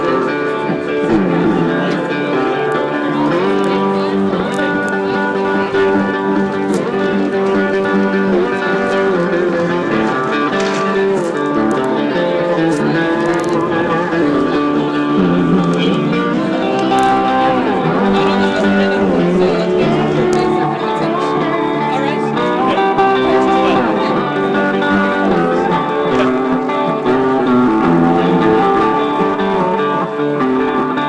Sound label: busking